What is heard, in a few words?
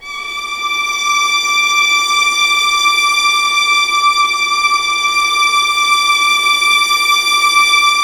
D4, Ultimate String Collection, VLAS EXP; LEG